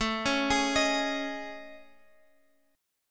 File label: A Major Sharp 11th, Guitar, Open F; AM#11 arpeggiated